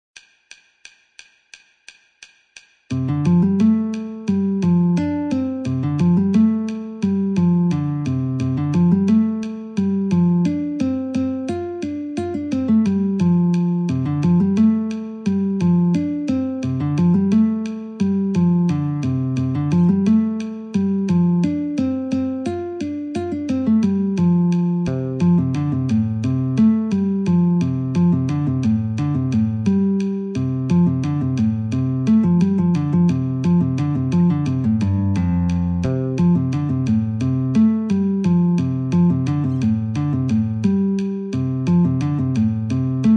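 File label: F Guitar metronome 88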